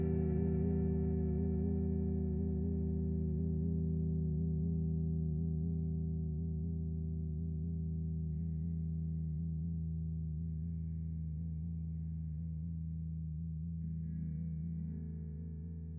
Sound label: guitar fx; skate